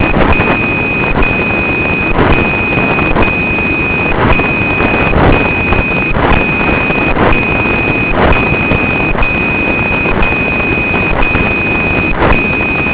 DCF77

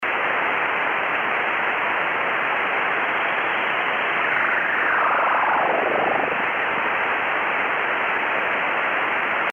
interference

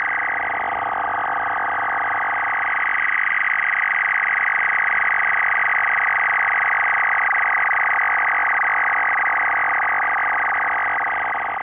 4fsk 200bd

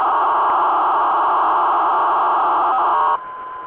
psk-500

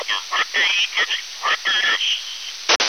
signal

